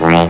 parp

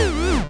ALERT; SMSoccer

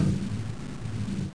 Ball Rolling

